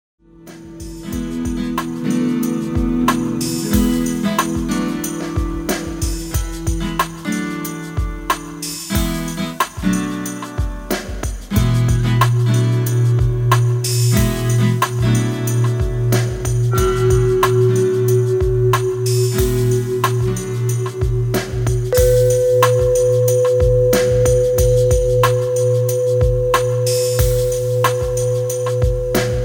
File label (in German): klangschalen massage